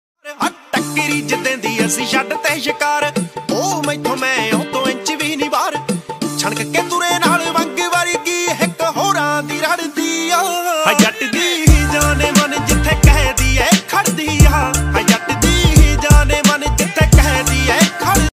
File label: DJ Punjabi Ringtone